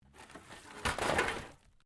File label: METAL SCRAPS MOVE